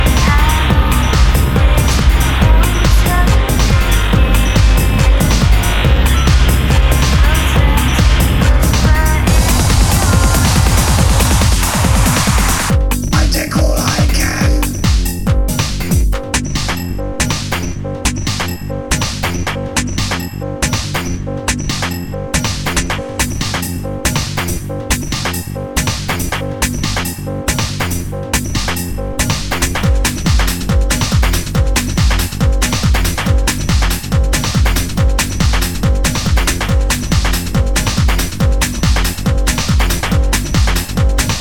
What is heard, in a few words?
trance